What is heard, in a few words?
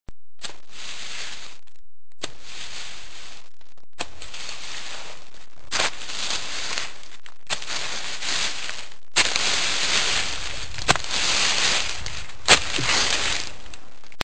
footsteps; Halloween